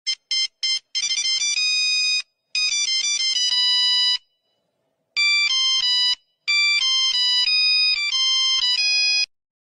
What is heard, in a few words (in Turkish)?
3310 kum gibi melodi